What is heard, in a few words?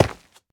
Stone Hit